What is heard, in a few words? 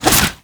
Rifle Swing; Warframe; Lasrian Soldiers; Weapons; Lasrian Lancer Rifle